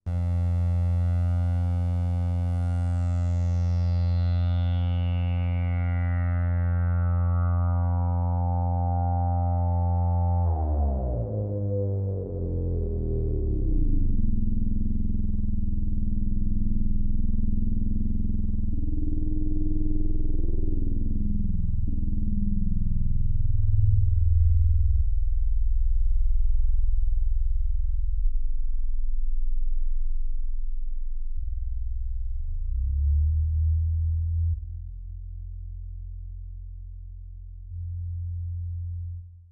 yamaha cs 5 bass

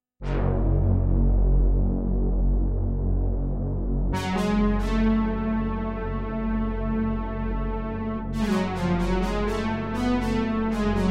roland jupiter 8

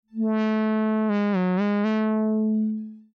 siel cruise mono moog

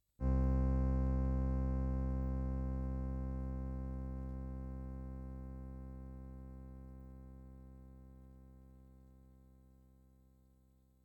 godwin string concert ton sustain

